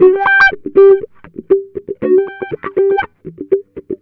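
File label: wah funk; Jazzistic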